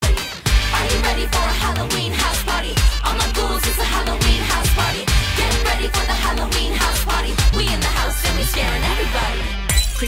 Halloween House Party

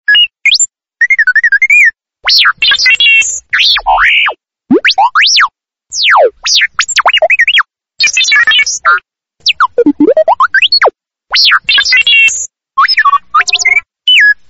Star Wars R2d2

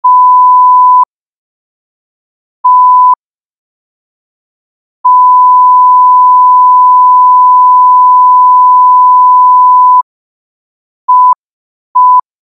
CENSOR BEEP

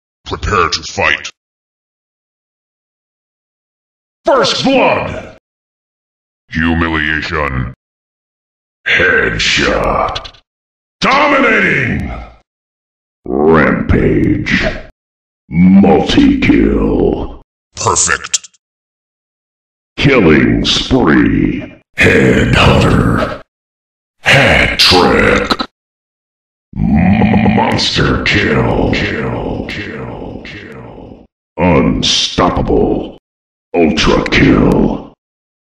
quake sounds; CSS

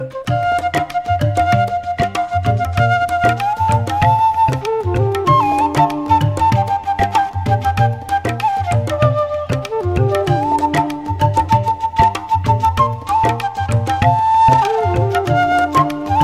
instrumental

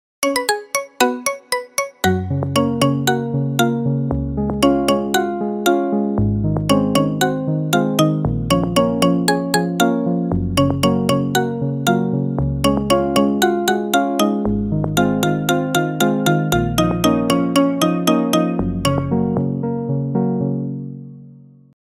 Marimba

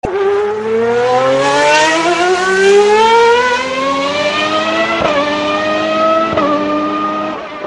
motors ferrari

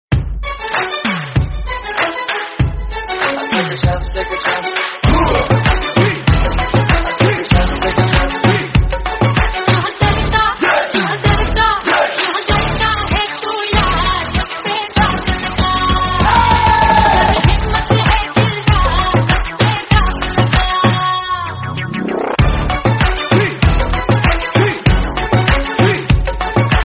Hindi Song; Windows 8

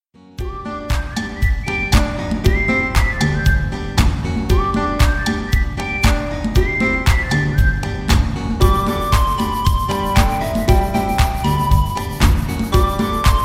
alarm sound tropical

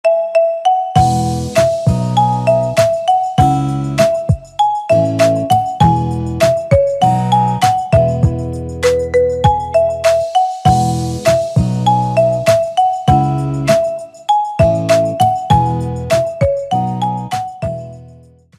boop like a road

